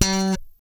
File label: POP G#4, bass; Spectrasonics; Bass Legends